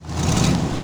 car rev

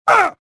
pain

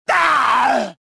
death; Star Wars Jedi Knight II Jedi Outcast; XBOX; bartender

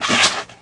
Large Spear Tail, Aliens

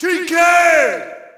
Shout, audience; UFC Tapout 2

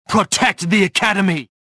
anger; jedi

